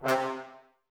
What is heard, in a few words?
Brass, Woodwind Brass Ensemble